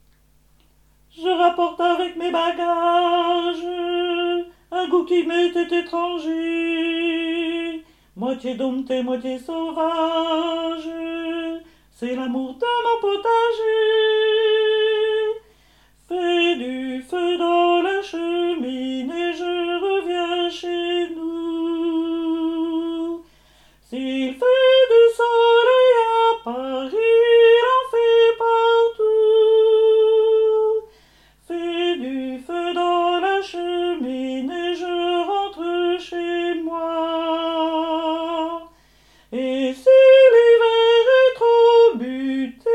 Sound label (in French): fin tenors